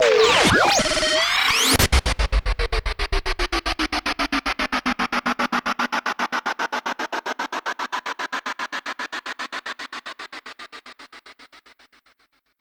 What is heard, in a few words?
Electro Static Accent